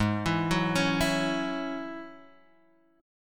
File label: G sharp 7th Suspended 4th Sharp 5th G sharp; G#7sus4#5 arpeggiated; x,x,x,1,4,1,3,1; JGuitar